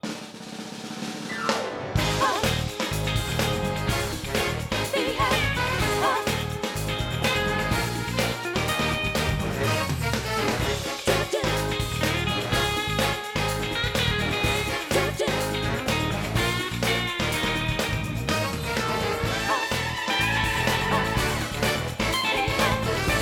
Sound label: Hush Now (Instrumental); My Little Pony OST Repository; Season 1; Bonus Music